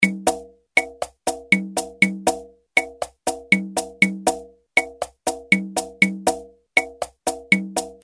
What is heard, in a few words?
beguine, Roland R-8